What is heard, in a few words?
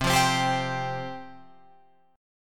C Suspended 4th C, Guitar Open F; Csus4 strummed